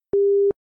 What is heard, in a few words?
Tone Busy UK